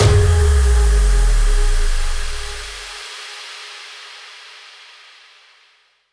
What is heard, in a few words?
Impact